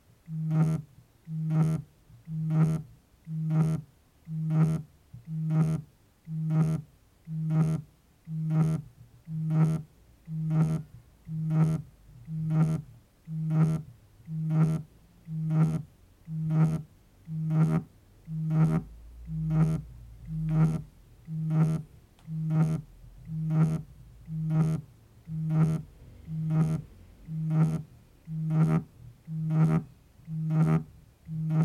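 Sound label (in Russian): смартфон вибрирует на деревянном журнальном столике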